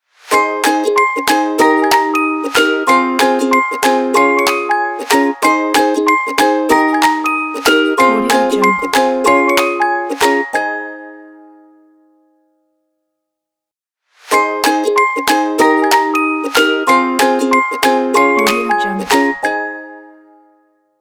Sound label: ukulele bells clap logo